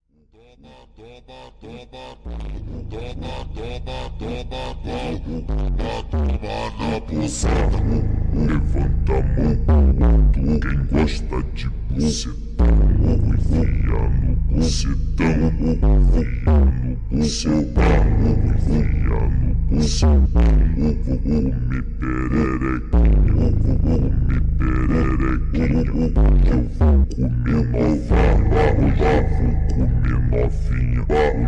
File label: phonk slowed and reverb